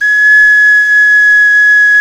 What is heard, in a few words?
Clay Flute